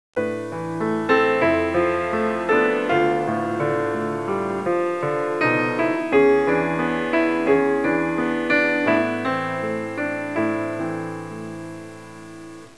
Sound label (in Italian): suonerie classica; musica classical